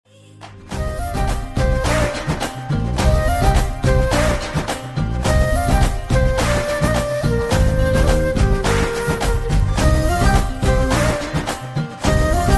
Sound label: love bgm ringtone; amaran